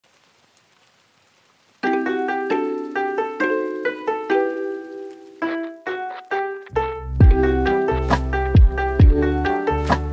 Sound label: lofi